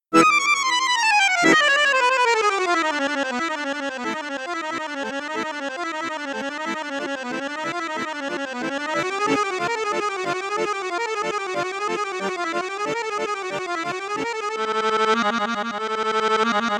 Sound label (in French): registre flute boite flute piccolo, accordeon; maugein resonance iv